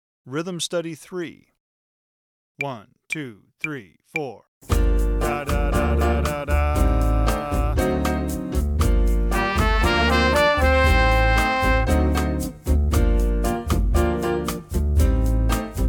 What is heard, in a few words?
bossa rhythm studies